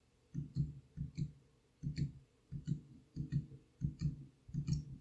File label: Genesis Zircon XIII Huano; Genesis Zircon XIII review